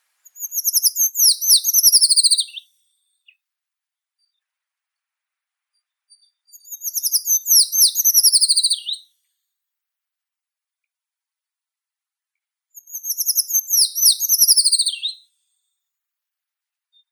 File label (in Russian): pishuha obiknovennaya certhia familiaris; zvuki prirodi